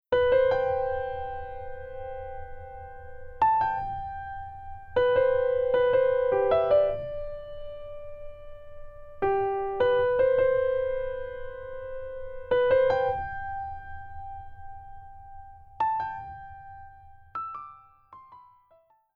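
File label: Future Bass Melody Piano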